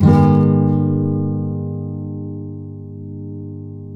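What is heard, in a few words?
nylon up minor A, guitars, flam chords; Spectrasonics; Hans Zimmer Guitars VOL-2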